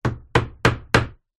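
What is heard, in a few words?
Hammer Nail 4 Hits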